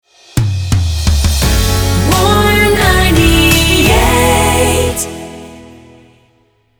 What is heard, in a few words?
jingle rapid fire